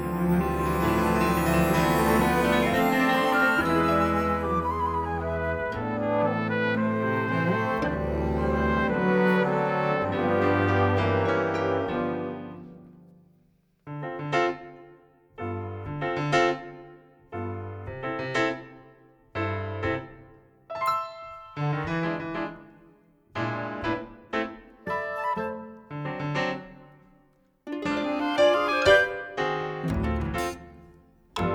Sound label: Audition Cut Instrumental Only